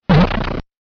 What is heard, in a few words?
Block Smash; SMW